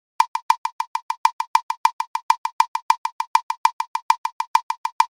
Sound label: intensity missing accented